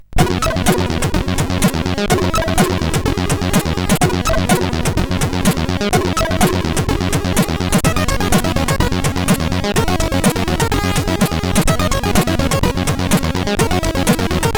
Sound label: Music Test, sid CSG8580R5